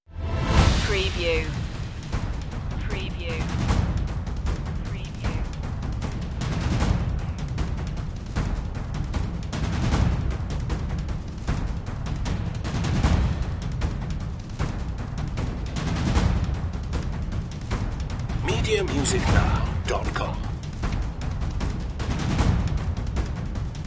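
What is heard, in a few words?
percussion mix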